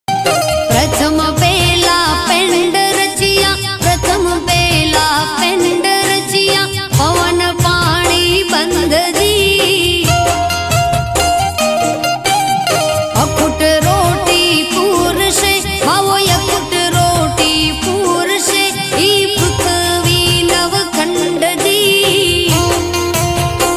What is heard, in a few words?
new gujarati ringtone